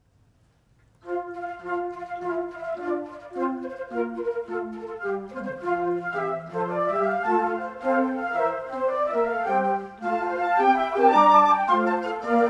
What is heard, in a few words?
Flutes Of Nature Squirre